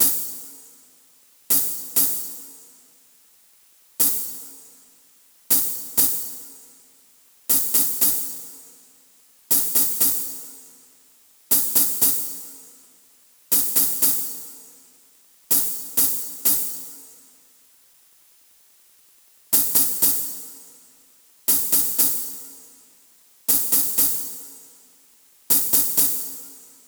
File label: drum hihat